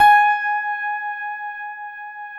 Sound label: PIA MICRS, MICRO-PIANO; Sound & Vision - Gigapack II